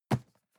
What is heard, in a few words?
cardboard box medium grab hard; objekte